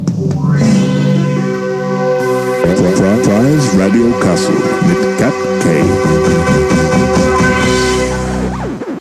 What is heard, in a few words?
Jingle